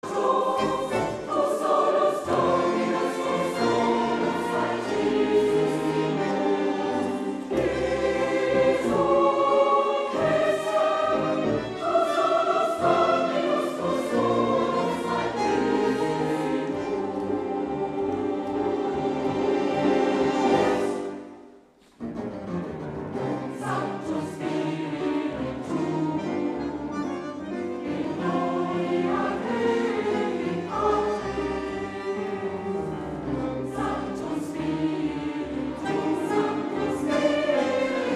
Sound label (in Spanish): gloria misa tango